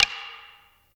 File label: Perc